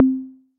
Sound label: Tom, Percussive; Tee Are Tom; Operator